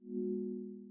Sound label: Click